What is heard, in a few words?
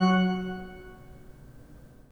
PIPE, 3DOrgans